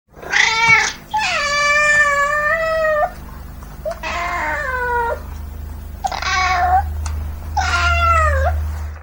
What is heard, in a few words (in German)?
konzert